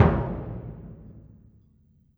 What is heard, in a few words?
Bass Drum, Orchestral Percussion One Shots; Sonic Foundry (Sony Creative Software); Orchestral VOL-2